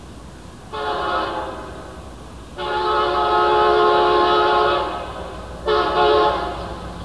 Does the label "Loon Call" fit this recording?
No